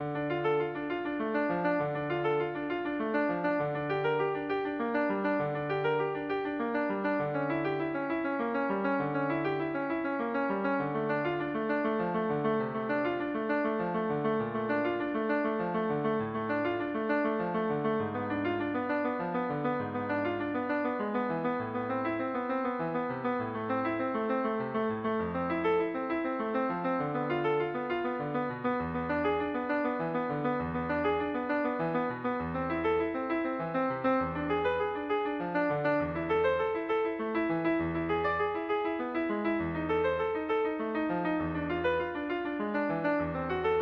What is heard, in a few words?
c min prelude, piano; bach